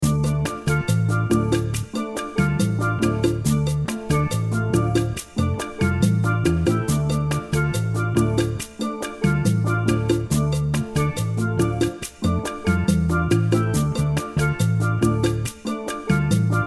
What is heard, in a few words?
one chord backing track (Dm); Calypso